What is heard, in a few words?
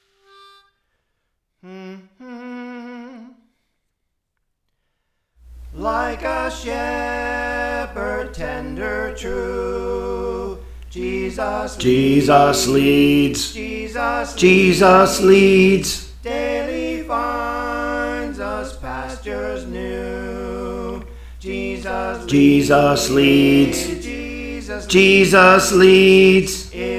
Bass; Praise For The Lord Training; Jesus Leads